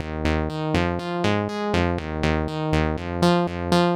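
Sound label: Synth bass, 121bpm, Nu Dance Electro; Zero-G; Soundclash; Acidized Wavs; Combi Loops; Slap n Tickle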